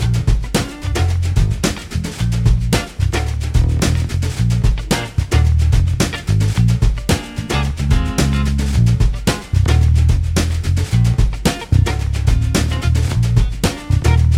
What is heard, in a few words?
solo loop